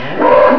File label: attack